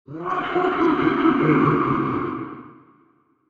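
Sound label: obake koe, ghost voice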